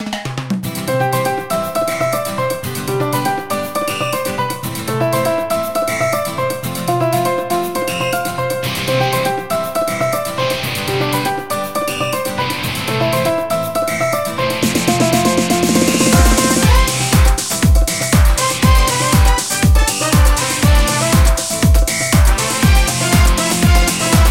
Latin Theme; LeEco; Le-2

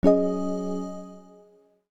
Cloud Default message; Samsung Galaxy Pop India; notifications

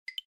battery charge; Coolpad 1827

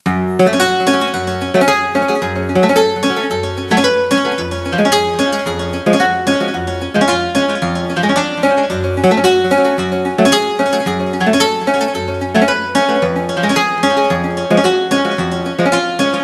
Ring Dusty Strings; Microsoft; Windows Mobile ringtones; Ring Tone Pack 7

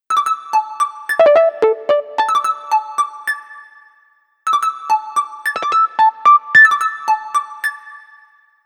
ringtones, Gigaset; GS290

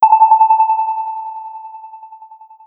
Boat, ringtones; Gigaset; GS290

